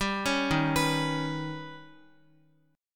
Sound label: Eb Augmented 7th Eb down Guitar; Eb+7 arpeggiated; JGuitar